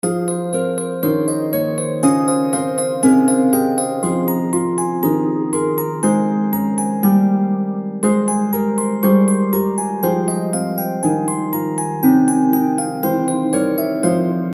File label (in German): Zwischenmusik